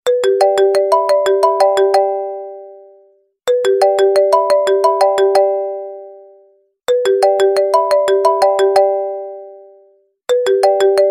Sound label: Iphone Ringtone